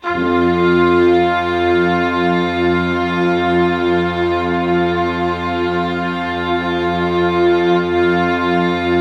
Band Orchestra F5, brass; Giga Samples Collection

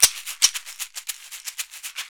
Caxixi Beats; Roland LCDP14 Africa VOL-2

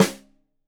Rim Shot Stereo, drums

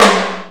GATE TOM; Greytsounds VOL-1; SDX TOMS

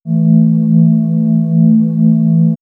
Swirls Ambient Drone, pads; X-Static Goldmine

